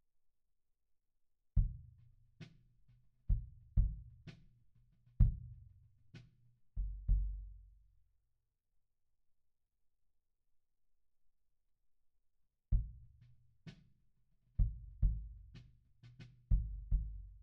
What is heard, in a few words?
Kick In, 96bpm